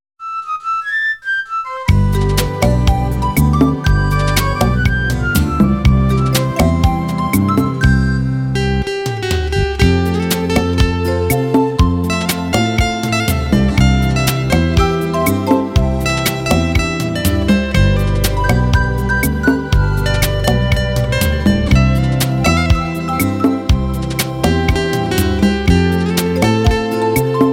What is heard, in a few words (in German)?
Glocken